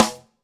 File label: AMB SN, AMBIENCE SNARE